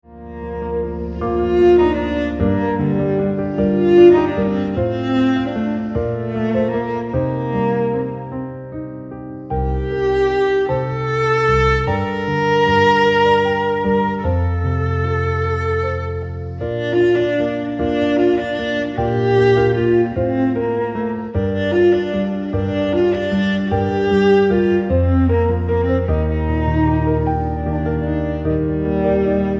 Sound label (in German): Cello Klavier